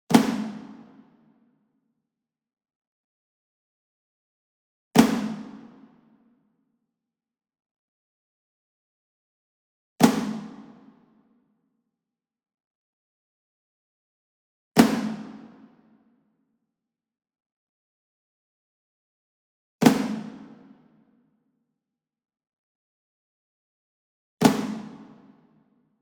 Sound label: SINGLE DRUM Shime Daiko Flam Medium, Cinematic Strikes; boom library; Cinematic Strikes Construction Kit